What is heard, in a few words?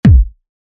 Kick